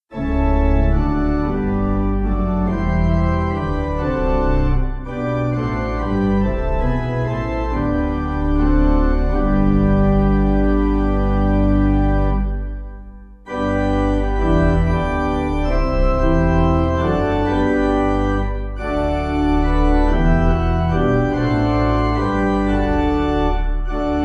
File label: Pipe